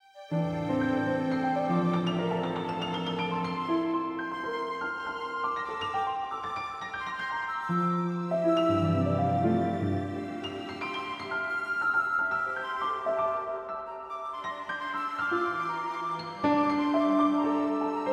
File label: forest music all